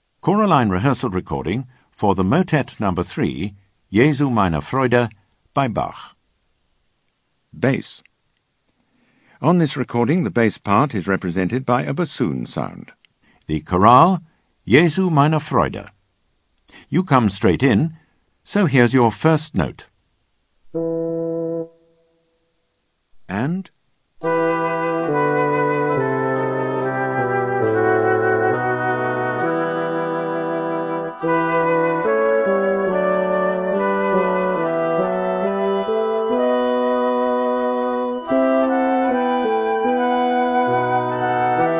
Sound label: Bass; Bach Motets 3&6